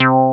SBASS; akai; mpc1000; factorypresetsamples

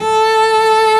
Solo Cello A4; Strings

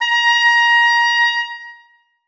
oboe a#5; Sonatina Symphonic Orchestra

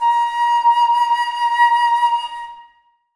alto flute a#5; Sonatina Symphonic Orchestra

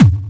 KIK; akai; mpc1000; factorypresetsamples

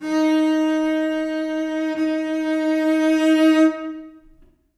cello d#4; Sonatina Symphonic Orchestra

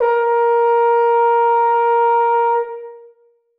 horn a#4; Sonatina Symphonic Orchestra

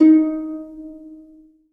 harp d#4; Sonatina Symphonic Orchestra

